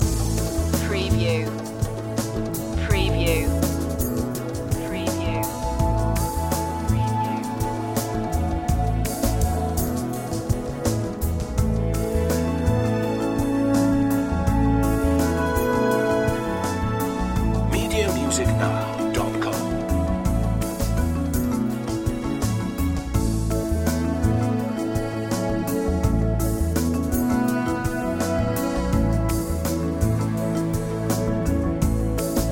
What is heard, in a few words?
paradise breeze loop